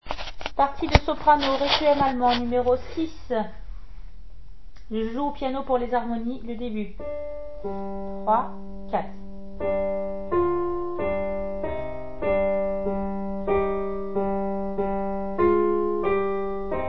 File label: solo piano; Brahms requiem allemand; mesures 3 à 16 solo piano